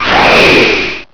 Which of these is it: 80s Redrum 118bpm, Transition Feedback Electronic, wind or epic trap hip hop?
wind